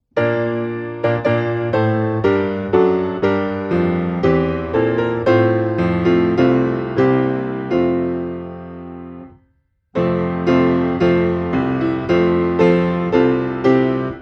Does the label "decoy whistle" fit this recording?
No